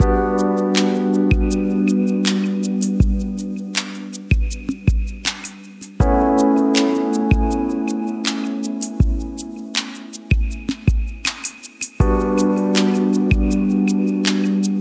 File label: Chillout Vibes